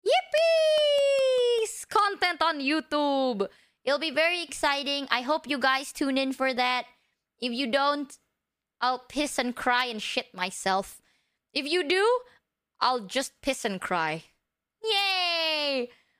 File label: yippieeee